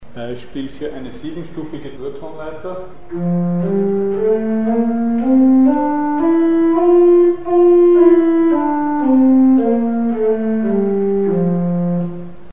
Siebenstufige Durtonleiter; Mikrobuch

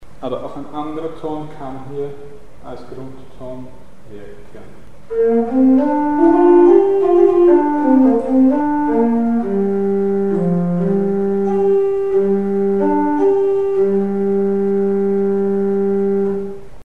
anderer Grundton in Pentatonik; Mikrobuch

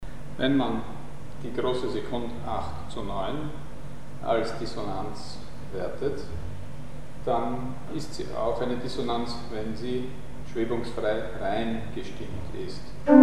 Dissonanzen bleiben auch bei reiner Intonation dissonant; Mikrobuch

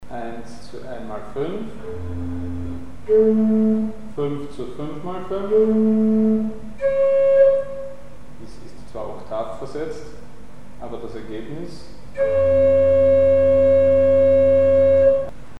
Milde Dissonanz durch Frequenzmultiplikation mit Primzahl 5 bei 5x5; Mikrobuch

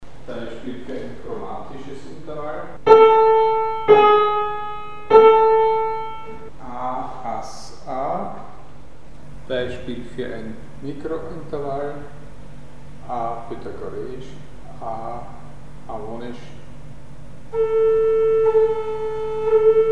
chromatische Intervalle und Mikrointervalle; z.B. enharmonische; Mikrobuch

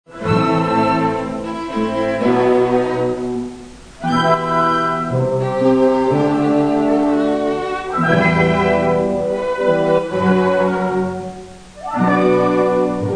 2. Violinkonzert 1. Satz Mitte; Soyka

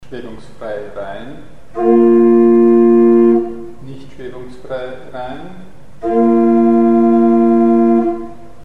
schwebungsfrei rein oder nicht; Mikrobuch

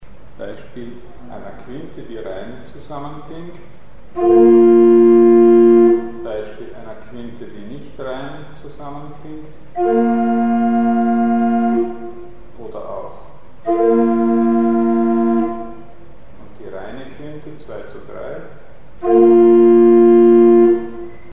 Quinte rein bzw unrein; Mikrobuch

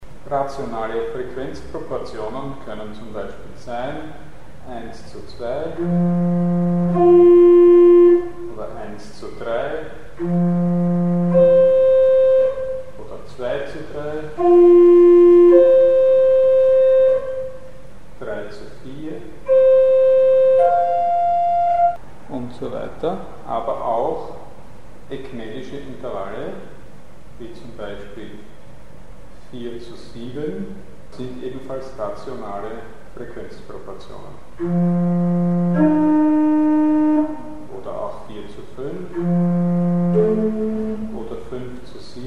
rationale Frequenzproportionen; Mikrobuch

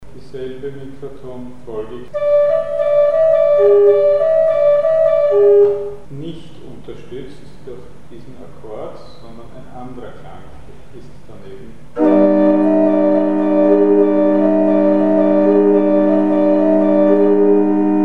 Mikrotonfolge konterkariert durch einen anderen Akkord; Mikrobuch

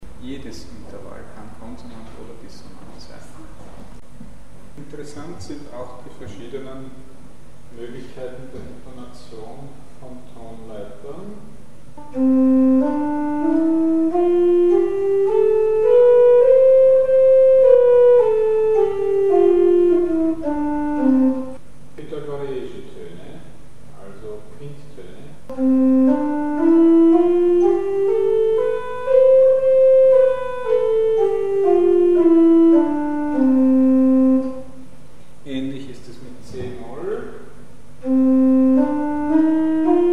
versch Tonleitern; mikrotonale Skalen; urseptimen

